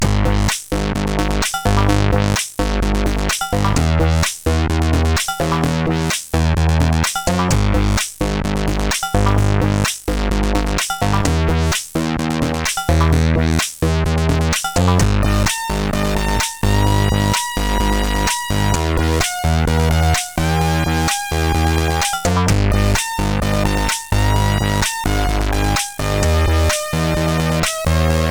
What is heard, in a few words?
Lil Bass, sid CSG8580R5